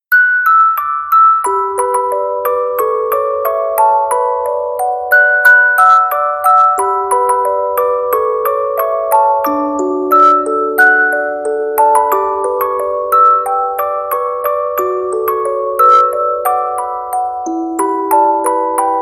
music box; fairy tail

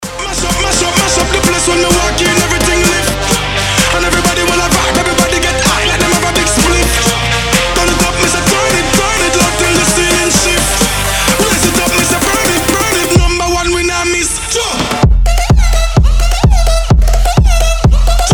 electro house

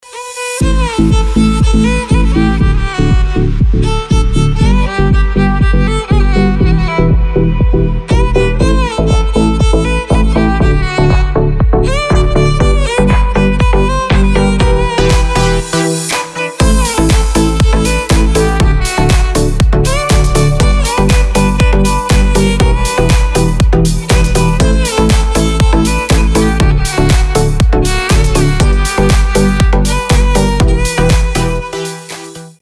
duo violins

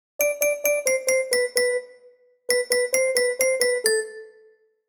xylophone sms

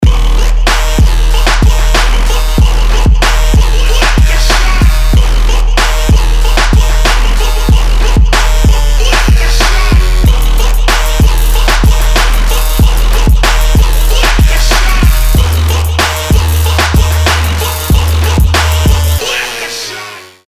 hardcore

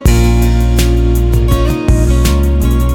guitar sms

